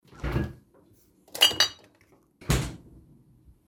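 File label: took a bottle in the fridge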